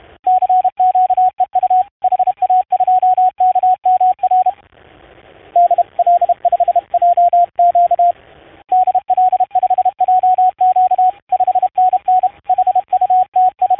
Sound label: CW Ctest 7006khz